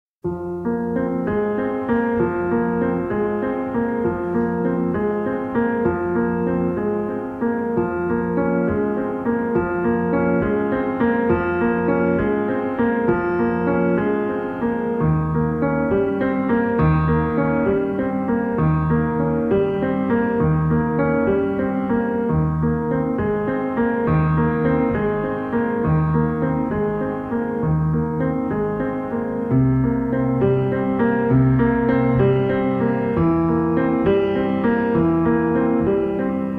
Solo Piano

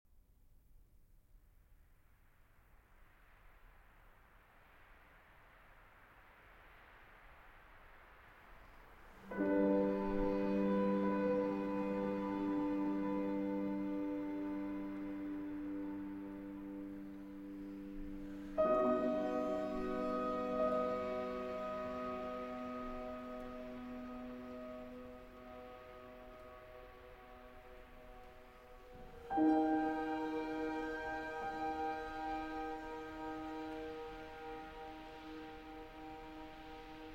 For Piano and Strings; Silvestrov

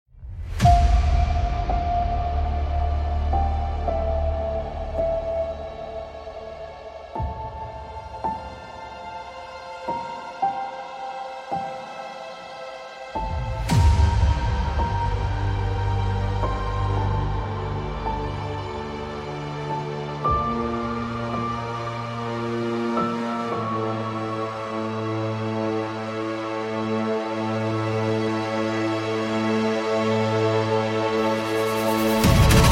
Keyboard Suite in D Minor, Sarabande; HWV 437

